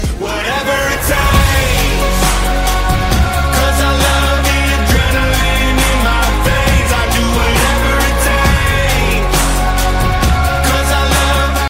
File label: cool ringtone